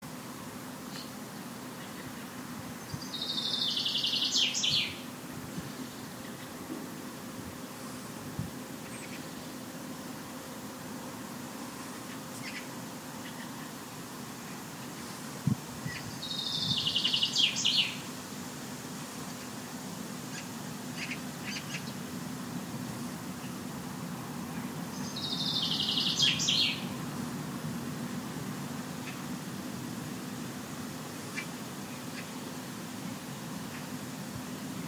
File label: silence and birdsong at Gasatorget in Falkenberg